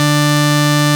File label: FARFISA D1; Sonic Reality; Vintage Timetraveler